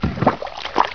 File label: SPLASH